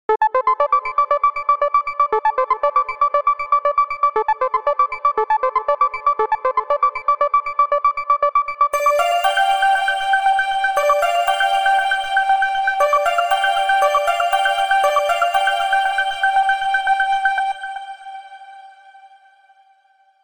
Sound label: electronic instrumental ringtone